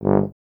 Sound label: BRS TUBA, Solo Instruments